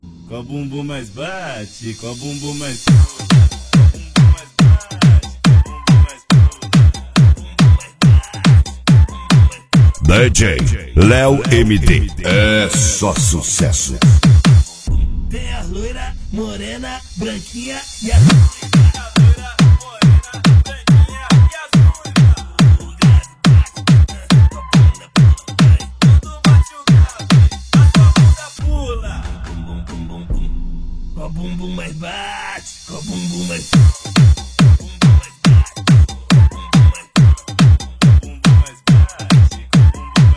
Batidão

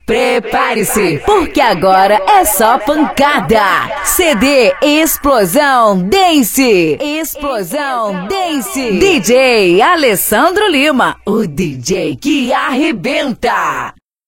Explosao Dance

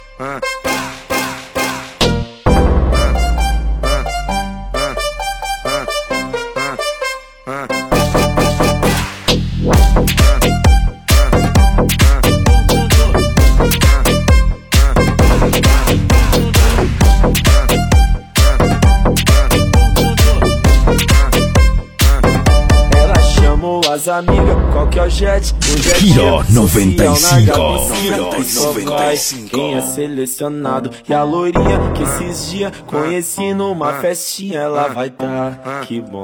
Batida do ElectroFunk

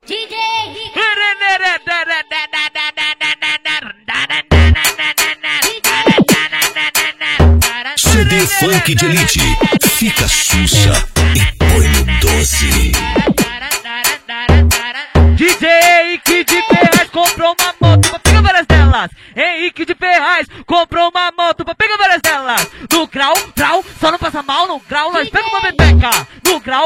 Funk de Elite

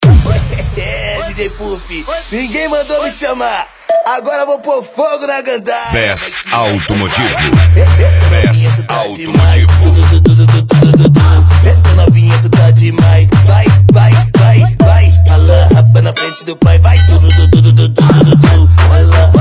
Bass Automotivo

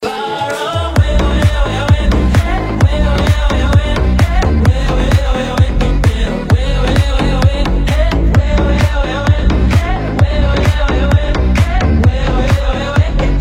Batida Dance

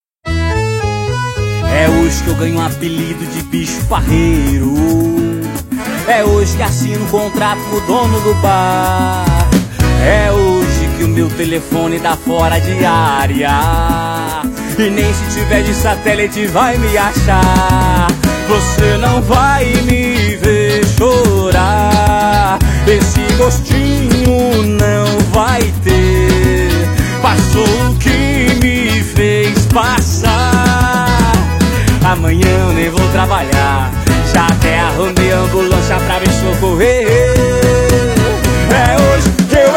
Ligar sirene

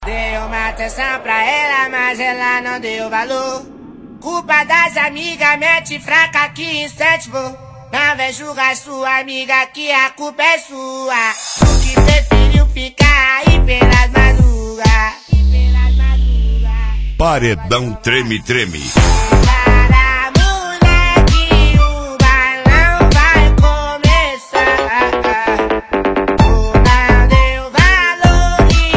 Paredao Treme Treme Mega Funk

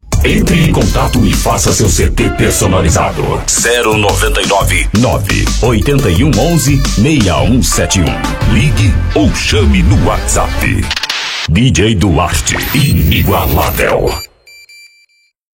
Reggae

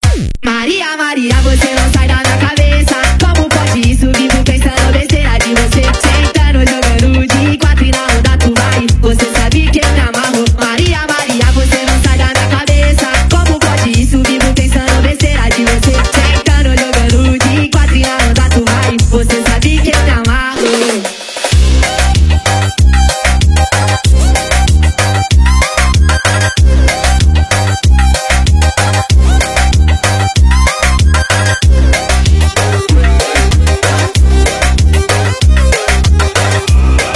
Forro e Piseiro

